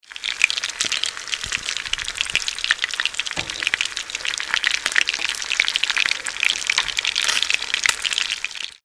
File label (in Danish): stege bacon